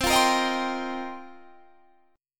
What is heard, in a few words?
F 9th Suspended 4th C, chord